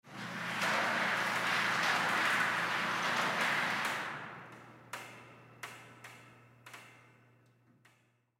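Shooting Range Interior, Shooting Range Rail Slide Back 10 Ft; SPORTS; Miscellaneous Sports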